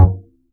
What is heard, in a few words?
Upright Acoustic Bass